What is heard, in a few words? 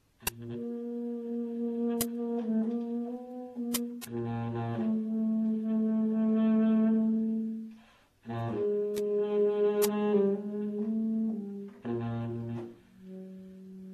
Piece for Bass Saxophone; excerpt